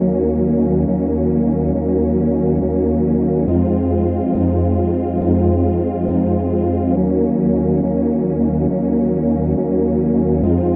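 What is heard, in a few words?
Pad, 069, D minor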